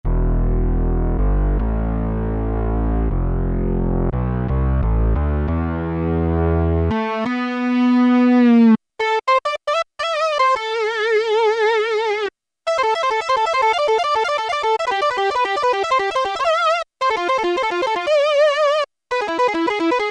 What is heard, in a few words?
LEAD LINE, Kawai SX-240